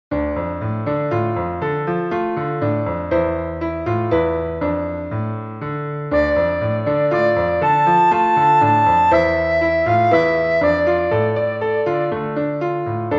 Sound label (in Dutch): alt plus piano karaoke; Blokfluitles in Utrecht